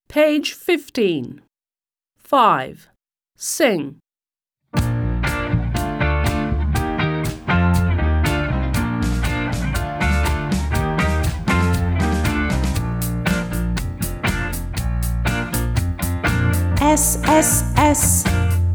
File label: song S to U; American; My alphabet book; Smart Book 1